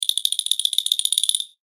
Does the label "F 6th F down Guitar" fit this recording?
No